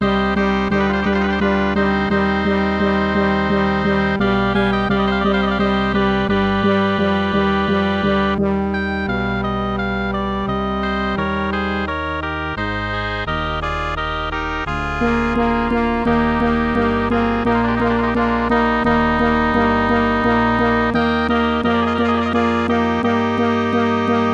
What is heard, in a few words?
MIDI